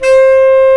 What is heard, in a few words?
Bs Clarinet; Lo Clarinets; Roland L-CDX-03 Disk 1